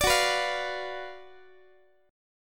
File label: Gb Major 7th Suspended 4th Sharp 5th Gb Guitar